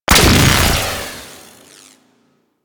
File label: Mod Proc Shield